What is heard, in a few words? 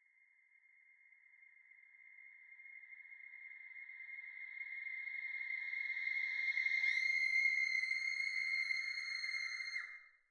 Reverse Reverb